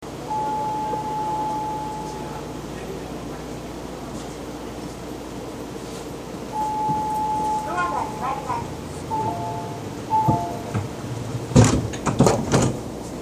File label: door; hiroden5100